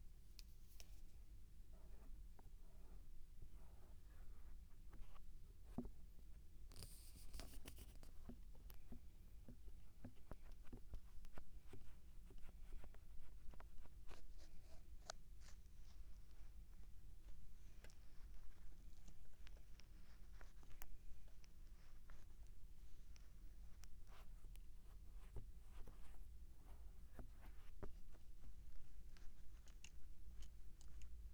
バイノーラル黒耳耳かき; ASMR・お手軽〜高額バイノーラルマイクの差を調査隊!!

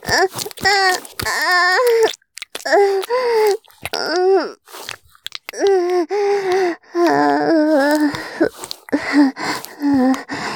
絶頂 中出し, ASMR; おまけ(絶頂+潮吹き+中出し ランダム再生)

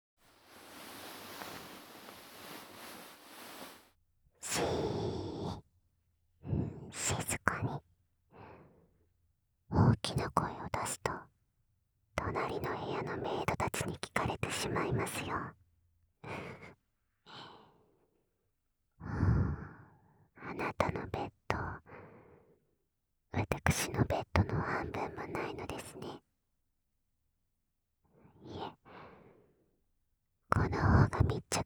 耳舐め こそこそ, ASMR; ご褒美耳舐め→こそこそ初中出しセックス

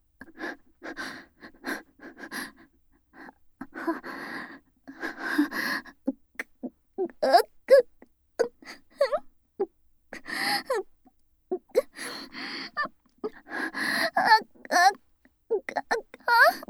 甘オホ喘ぎループトラック, ASMR; フォーリーサウンド; 他人に興味ゼロな美少女クラスメイトが『事務的妊活パートナー』に選ばれた話

